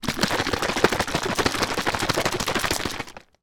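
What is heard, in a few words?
lemon or lime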